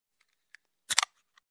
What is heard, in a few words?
Soda can opening